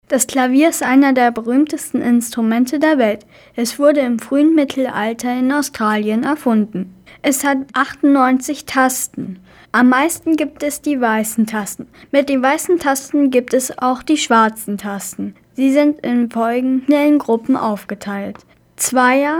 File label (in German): INSTRUMENT Klavier